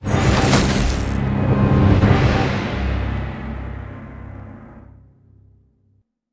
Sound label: round draw; Chaos Combat Chess; iOS, MacOS, Windows